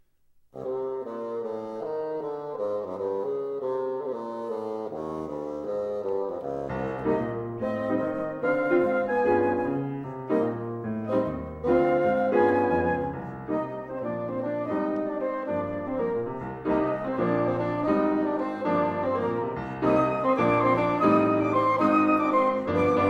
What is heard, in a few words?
Shuffle, bassoon